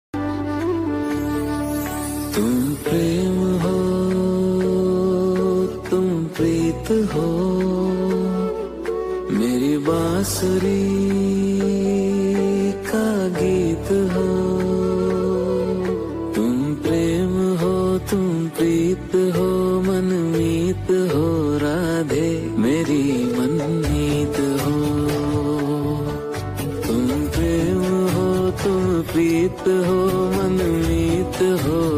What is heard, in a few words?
Hindi